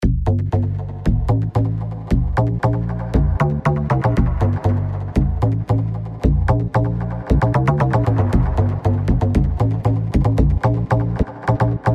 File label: FM DONK